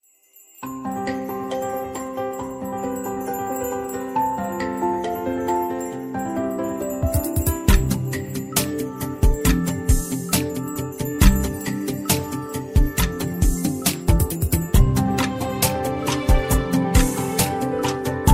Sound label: new cool music, ringtones